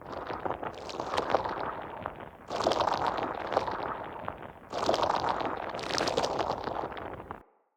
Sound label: flock charge loop; Enemy; Abilities; Sinecure; Ranged Hunter Thawtide